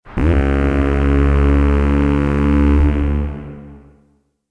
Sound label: Steamship Whistle; Modular Sound-FX; David Ryle's Goofy FX sounds